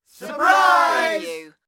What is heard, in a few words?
Crowd Surprise